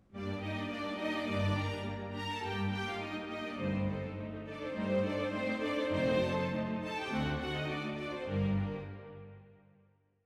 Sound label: ORCH REDUC piano; Mozart; Symph40 K550